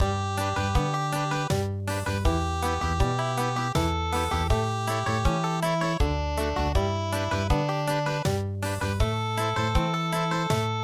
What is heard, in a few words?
Country; midi; traditional